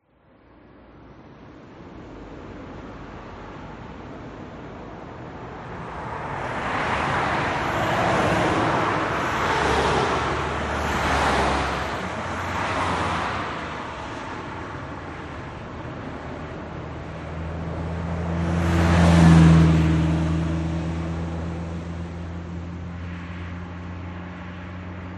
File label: vehicles traffic fast pass road quiet birds; zapsplat